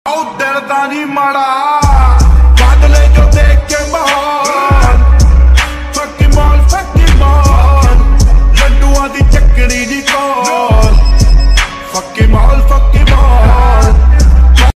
call tone; legend

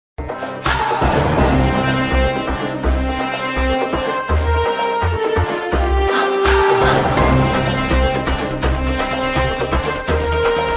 mass bgm ringtone; vettaikaran

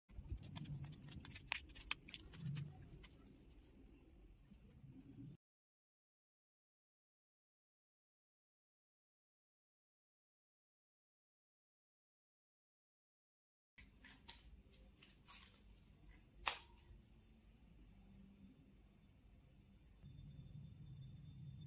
girl cell phone ringtone; musical mobile toy

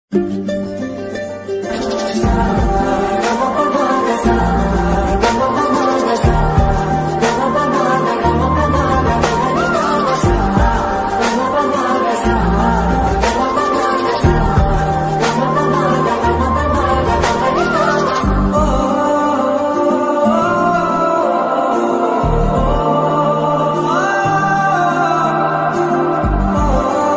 love bgm ringtone melodious love ringtone; vakeel saab

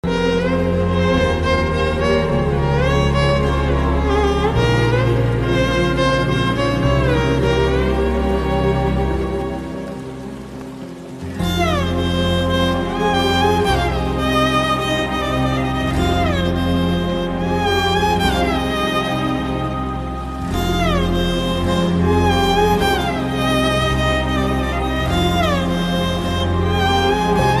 lovefail bgm ringtone; baby movie; lovefailure

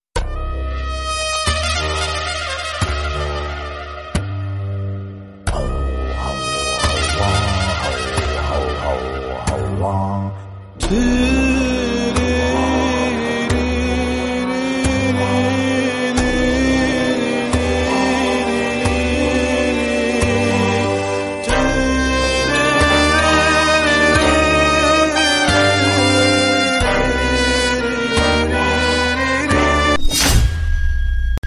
bgm ringtone tamil ringtone; vada chennai

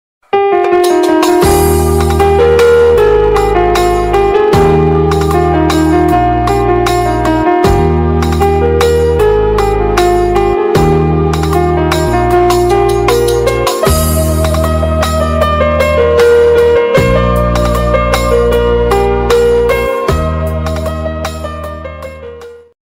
piano ringtone

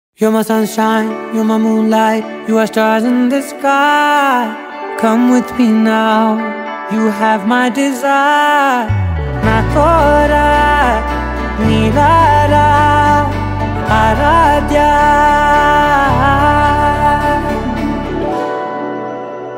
telugu love ringtone; Kushi

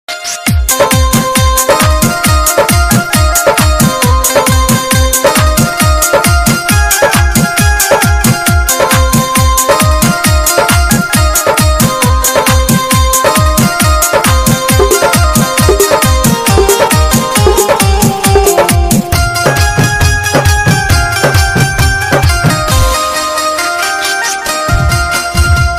phone ringtone dhamaka machane wala

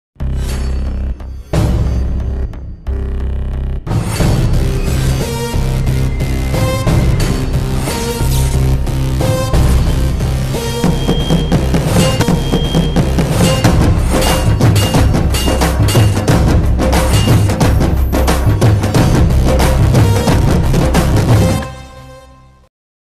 movie trailer bgm ringtone; Gully Rowdy

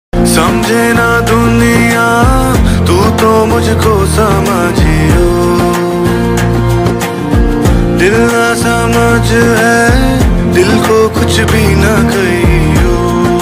love ringtone romantic ringtone

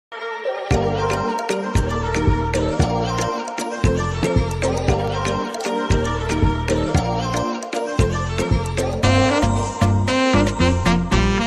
movie bgm ringtones telugu ringing tones; check movie

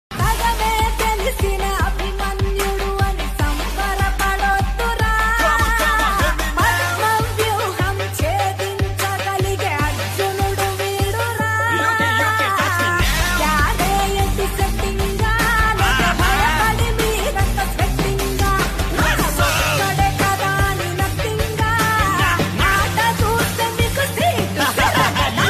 bgm ringtone; a1 express; sindeep kishan; lanvanya tripati

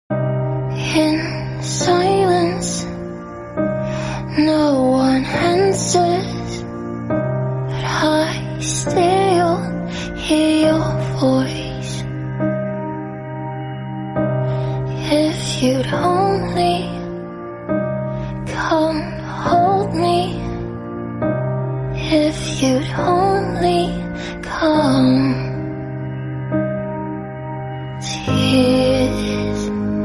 new korean drama sad ringtone new korean ringtone

